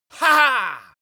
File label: human vocal male victory triumphant